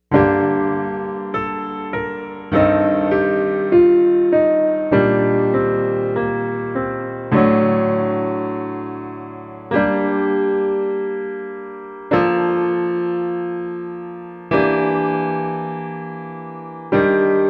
Piano Acc

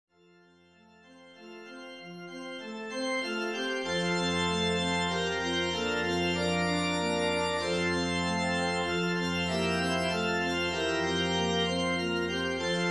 hymn F Low

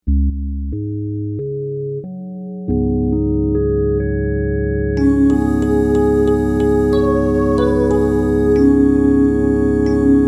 Organ Study

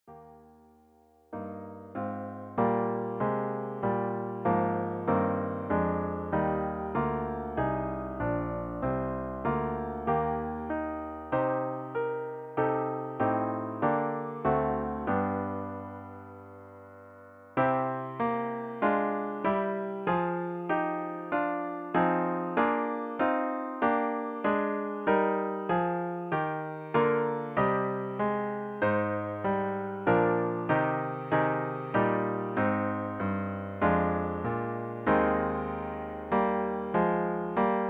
hymn Eb Low

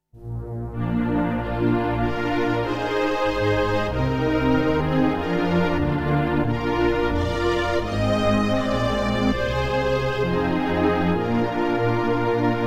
communion hymn medlow acc

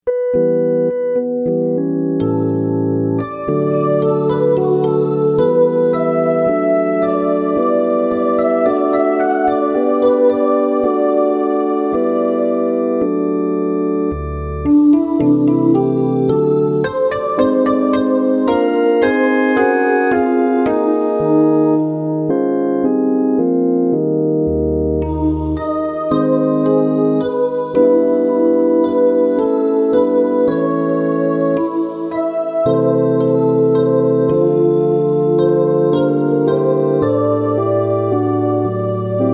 Organ Study

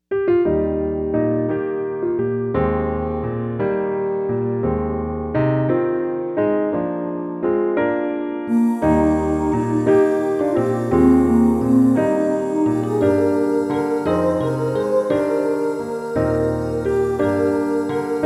PIANO Study LOW

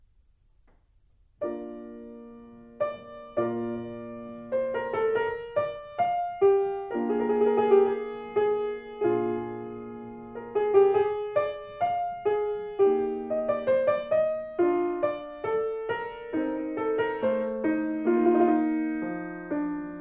Sarabande From Partita In Bb Major; Bach

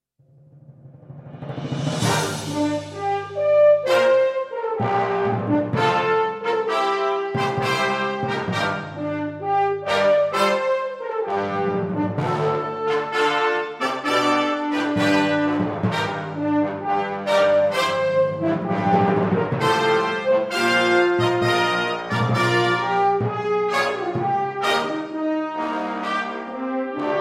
Christmas Celebration Fanfare

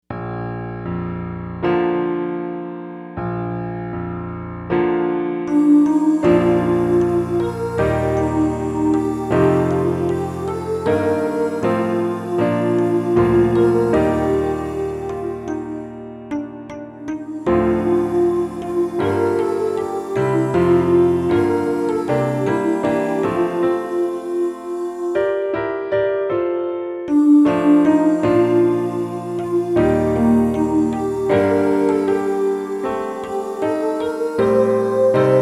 PIANO Study LOW

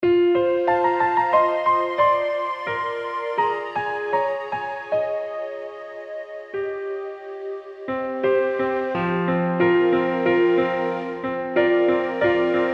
Communion Hymn Acc High

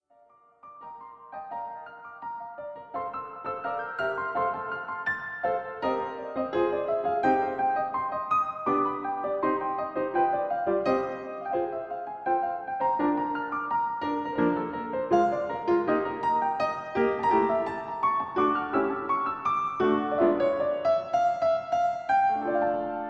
Toccata, postludes

